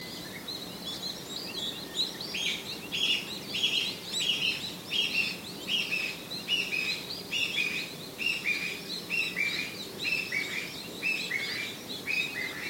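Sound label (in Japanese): kojukei, Chinese bamboo partridge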